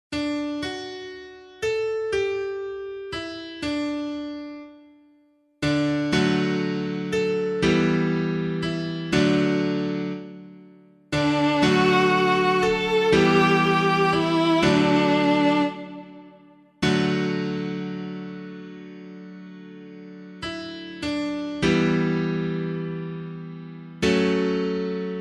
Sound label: Ordinary Time 31 Psalm B - piano; Grail stanza text